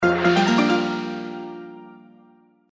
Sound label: grow; Journey To West